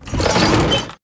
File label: Cart Attack Underlay